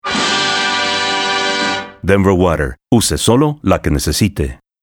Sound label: Orchestra